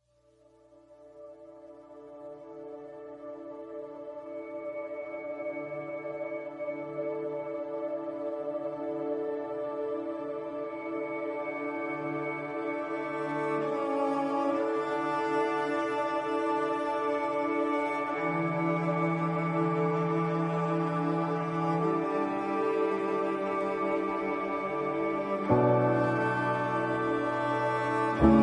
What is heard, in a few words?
Instrumental